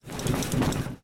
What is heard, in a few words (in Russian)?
negromkij grohot peretaskivaniya slomannoj tehniki